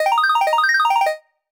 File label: Item Jingle; Rhythm Doctor